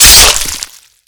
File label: slash; killmove; Garrys Mod